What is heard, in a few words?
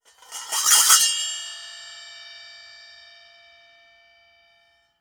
Cym B